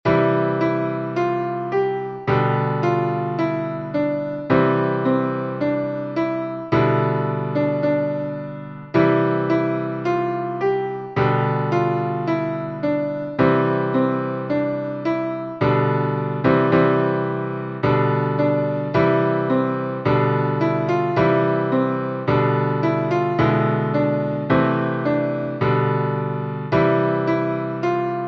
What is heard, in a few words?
ODE TO JOY Mid C 108bpm